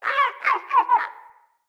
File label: Freed Beaver Call; Encounters; Animal Rescue